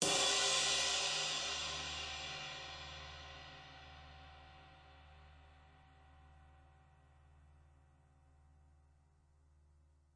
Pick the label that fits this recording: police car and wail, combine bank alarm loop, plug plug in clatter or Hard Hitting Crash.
Hard Hitting Crash